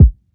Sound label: tr 808 synthetic kick a sharp key